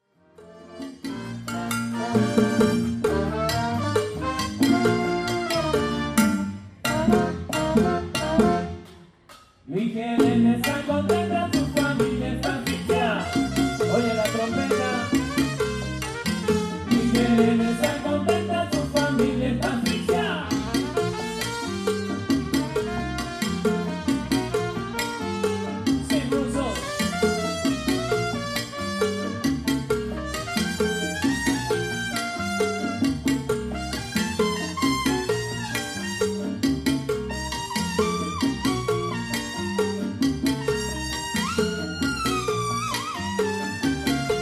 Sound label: allegro ballroom